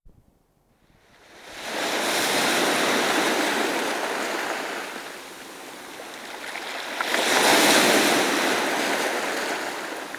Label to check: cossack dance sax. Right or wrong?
Wrong